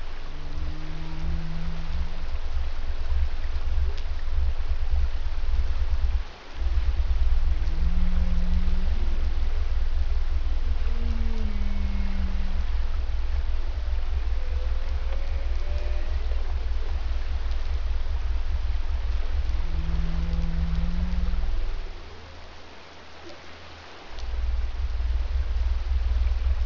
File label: Pygmy blue Whale Typical three part call